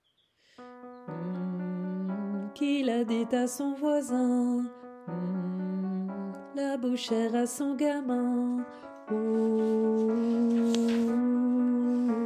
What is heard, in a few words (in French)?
VOIX Alto; LA CHORALE ANARCHISTE